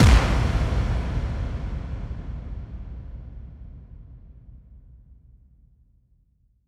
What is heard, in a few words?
bassdrum reverb FX kick; Vengeance Samples